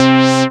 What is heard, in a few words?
SYN SH, Roland; Sound & Vision - Gigapack I CD 2